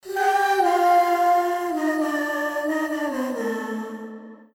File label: Jingle Title Screen Jingle